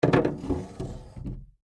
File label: Foley Dresser Drawer Open; Drawers (Sound Effects:Household)